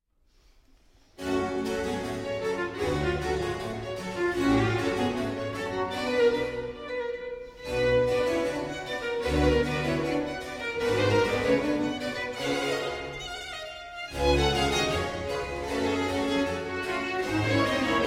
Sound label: Violin Concerto in G Minor; BWV 1056R